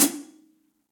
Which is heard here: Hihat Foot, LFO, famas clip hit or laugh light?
Hihat Foot